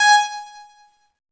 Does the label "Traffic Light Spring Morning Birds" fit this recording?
No